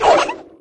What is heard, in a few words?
girl toss plane; HeavenStudioPlus; trickClass